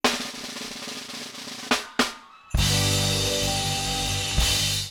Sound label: Drum Roll Sting; Tonight Show